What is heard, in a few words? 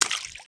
splash; water splash slime sfx